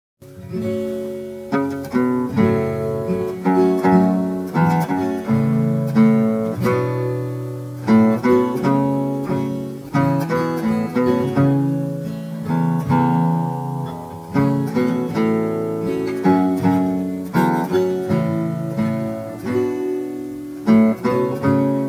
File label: Oud Parting Glass